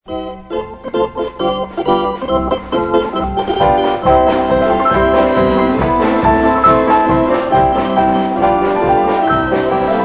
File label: Victory March